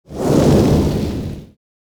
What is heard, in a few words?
fireball